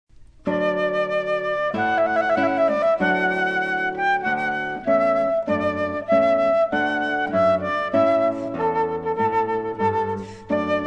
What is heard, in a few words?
Trumpet Voluntary Duo